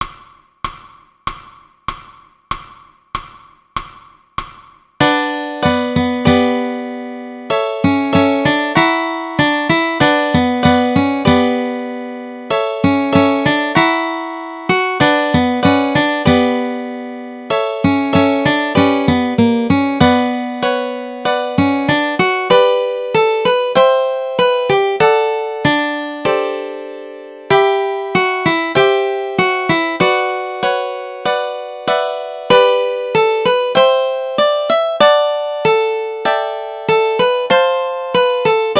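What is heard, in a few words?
Guitar Melody Chord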